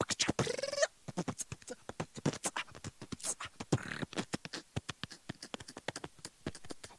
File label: beatbox